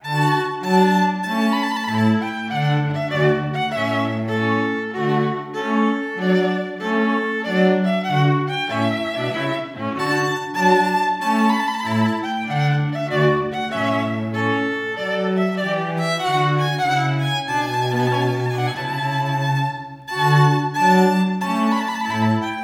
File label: trumpet tune; Trumpet Tune by H. Purcell; Classic Audio Excerpts